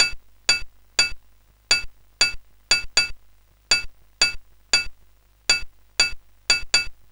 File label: Triangle loop, Sonic Drum Kit